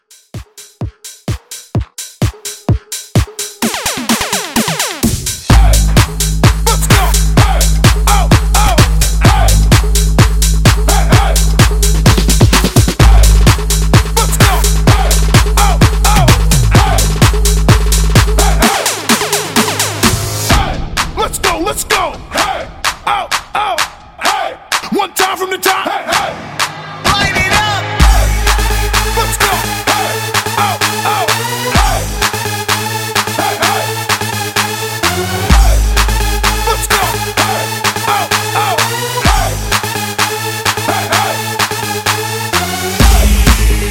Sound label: Famous Chant Tribute Edit